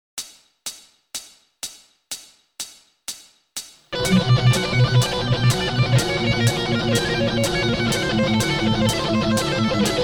tapping

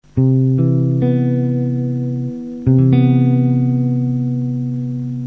c maj7 shell

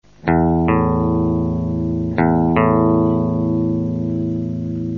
ear tune

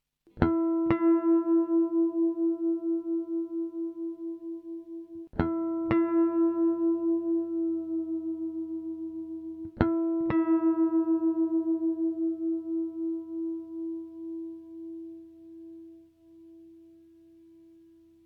e a harmonic tune down

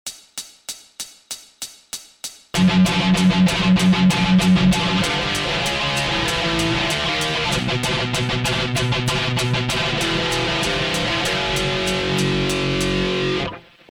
thrash

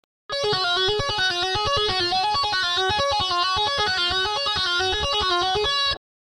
tapping

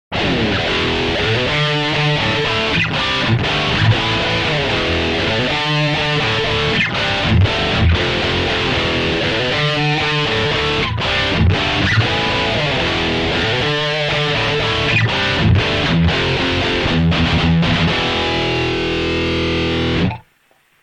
5add9 dirty

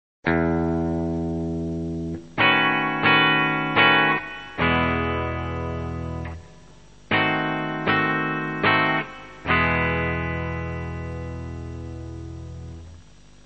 vii tension

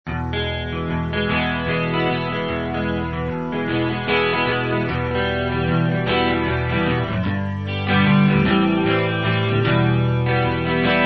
major minor

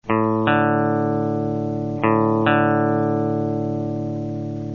ear tune

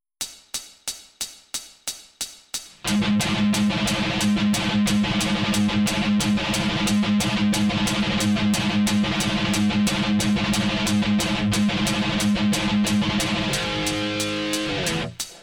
thrash mix b

